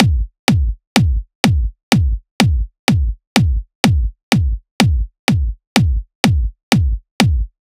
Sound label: Drums